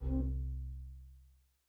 lower oneshot hoover

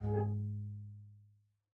lower oneshot hoover

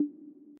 over rev

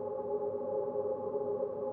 lower loop cute drone

